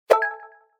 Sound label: Fade in